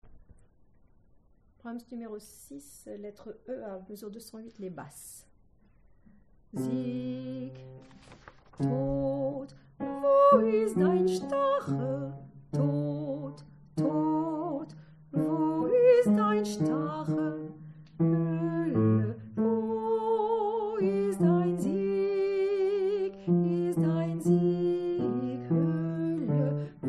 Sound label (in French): Basse